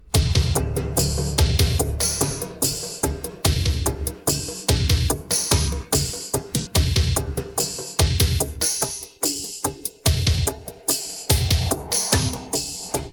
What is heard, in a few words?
drum